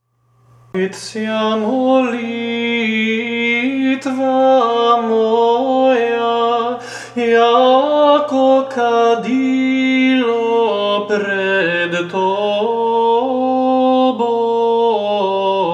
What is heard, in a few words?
Da Ispravitsja prvi glas, Serbian chant